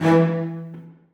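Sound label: celli staccato d#2; Sonatina Symphonic Orchestra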